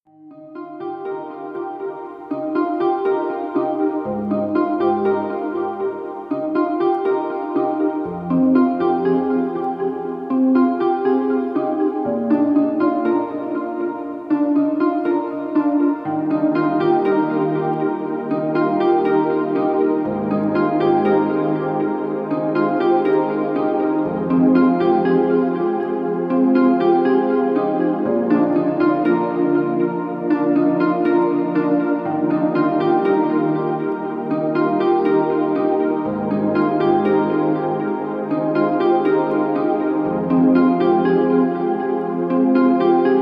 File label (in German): Entspannungszeit Melodien der Natur; gefuehlvolle entspannungsmusik